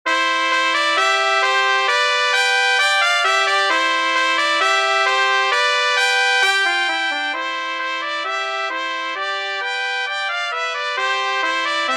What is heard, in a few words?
trumpet tune fanfare